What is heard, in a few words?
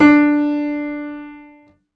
Piano, Harmonic